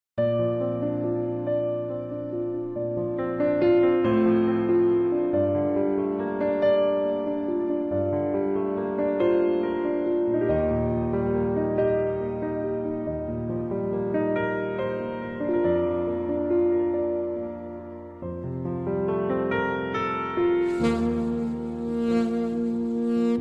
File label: Saxophone Cover